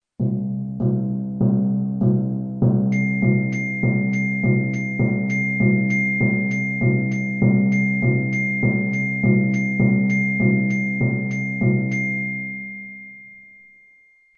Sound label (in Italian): contrattempo